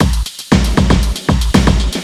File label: Dance Drumloop; Drums; Dance Beats